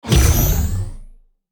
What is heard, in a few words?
boost